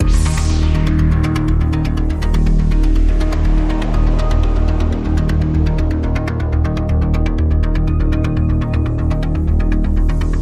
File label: Radio Toolkit Pad